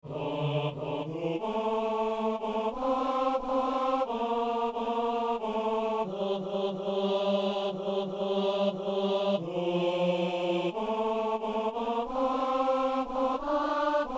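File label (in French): ténor; Laisserons-nous à notre table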